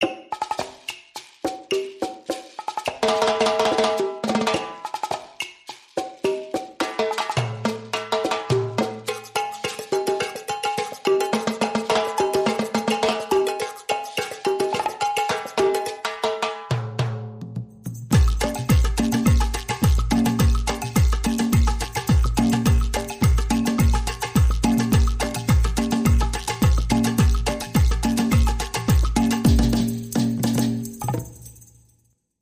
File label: Salsa Senora (Score), Percussion Only